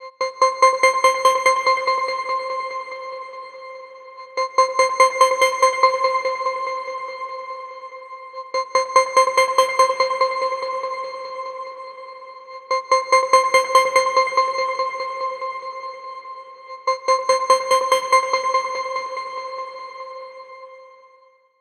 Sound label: Chic Tropical Night, ringtones